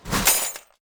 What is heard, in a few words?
cutlery drop